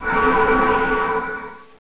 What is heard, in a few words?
acid, bullchicken; cs16; cstrike